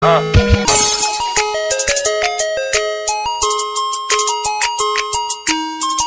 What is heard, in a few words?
ice cream truck trap remix